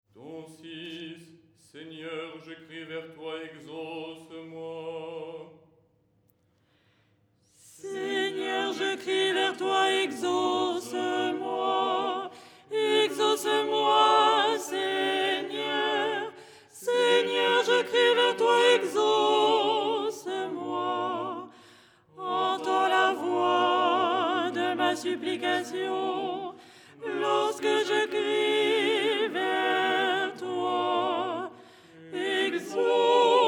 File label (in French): soprano; " Seigneur je crie vers toi… "; Les 8 tons en français; ton 6